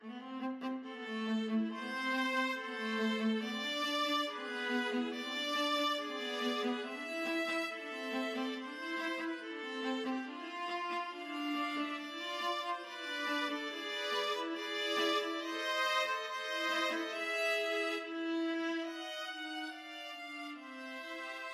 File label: confutatis viola trio